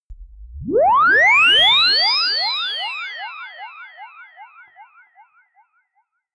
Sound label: quick liftoff ufo